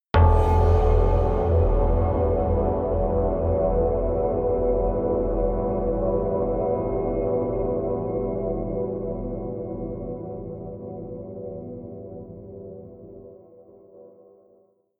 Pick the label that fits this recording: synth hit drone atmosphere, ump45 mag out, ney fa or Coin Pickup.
synth hit drone atmosphere